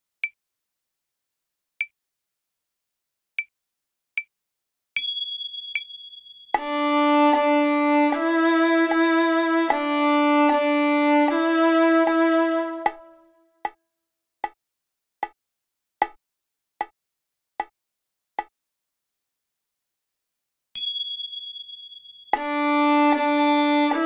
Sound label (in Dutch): Papegaaienspel D snaar Viool